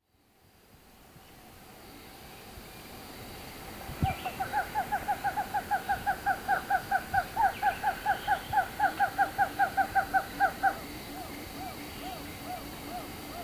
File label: Black tailed trogon